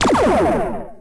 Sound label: Laser Weapon, sci fi; soundfx